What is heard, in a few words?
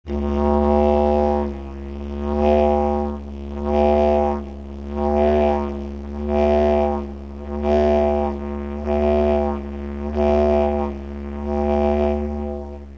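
fast volume modulation, didgeridoo; speeding up this modulation of the volume; Now let's try speeding up this modulation of the volume…; Timeless Productions; didgeridoo tutorial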